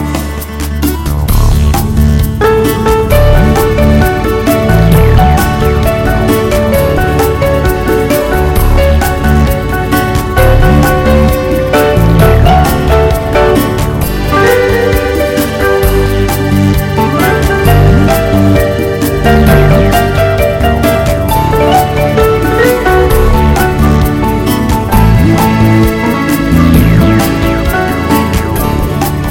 Wonderful Guitar Bossa

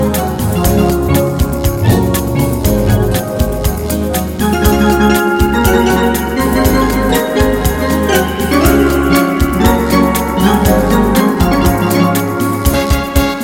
Fast and Hot Beat Cha Cha

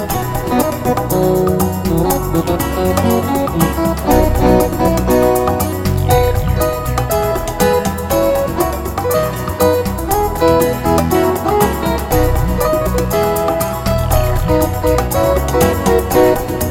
Happy and Hot Samba

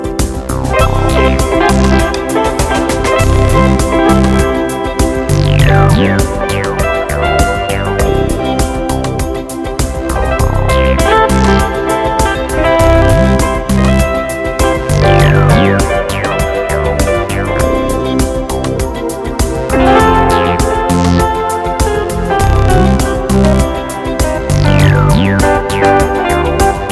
Rare and Pretty Sounds

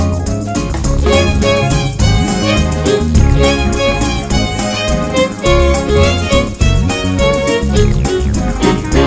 Hot and Smooth

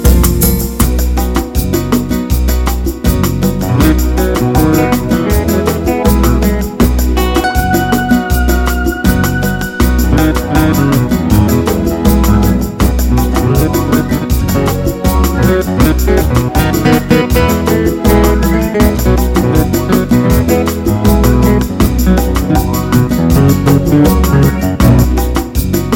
A Hot Bossa Nova

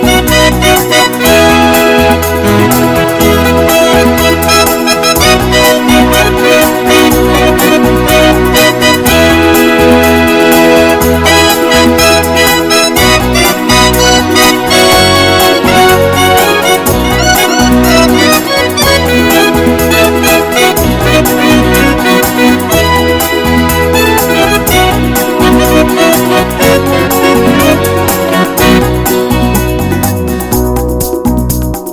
Pretty and Hot Beat

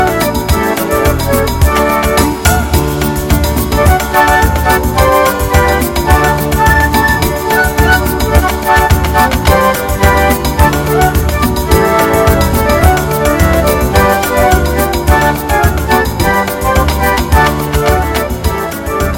Fast Samba Dancin

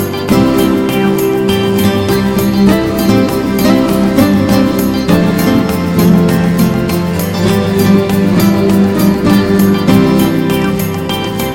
Very Full Ballad

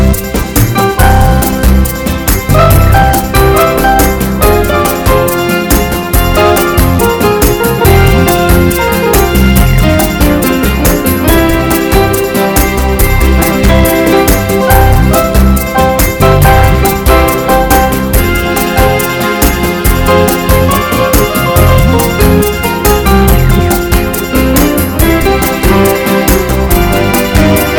Beautiful Tango

